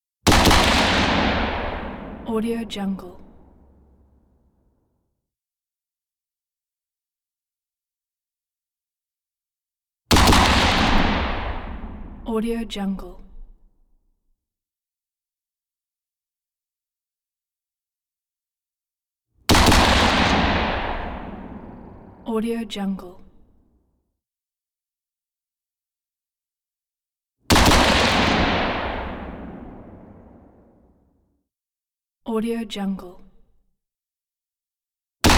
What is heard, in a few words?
m4 shots